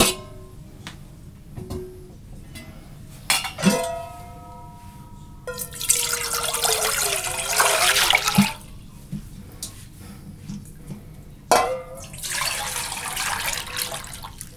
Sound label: clang